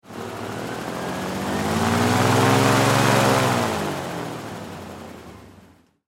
Air Boat Rev; Air Boat Engine; Transportation Watercraft; Boat Sound Effects; Boats (Sound Effects:Vehicles); Blastwave

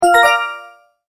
multimedia tone start

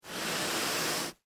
Body Drag; Horror; Blood and Guts; BlastWave

